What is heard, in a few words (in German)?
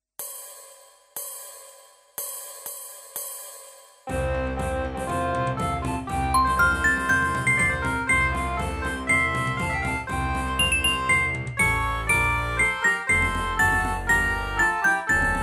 glocken